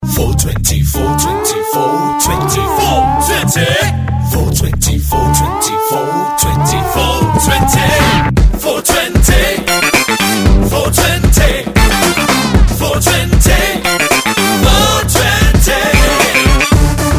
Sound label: Music